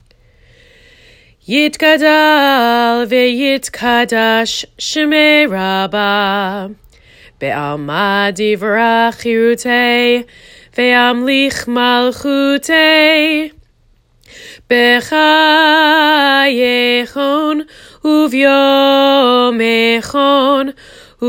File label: Hatzi Kaddish